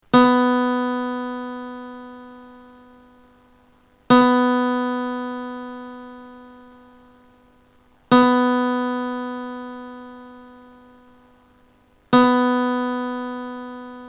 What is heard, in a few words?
B 2nd string Guitar